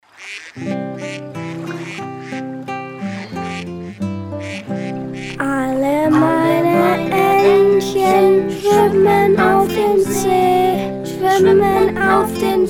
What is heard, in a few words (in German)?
singt Alle meine Entchen